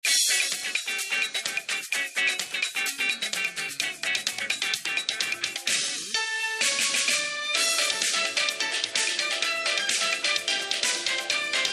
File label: Latin Party